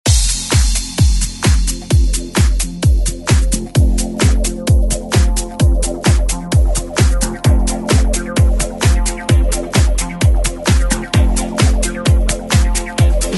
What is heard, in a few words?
80s Club Re Work; DJ Mhark